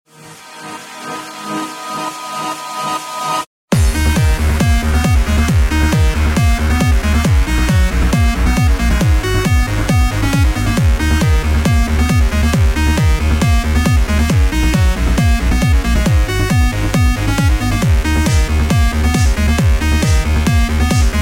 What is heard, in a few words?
techno ringtone